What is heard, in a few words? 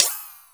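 WARP cymbal, effected drum; Crypton - DrumBase5000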